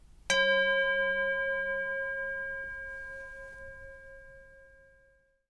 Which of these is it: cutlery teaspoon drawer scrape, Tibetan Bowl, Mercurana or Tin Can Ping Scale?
Tibetan Bowl